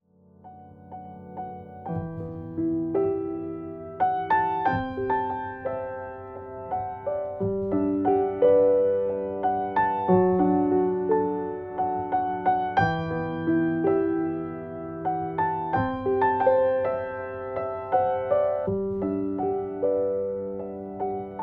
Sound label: Grand Piano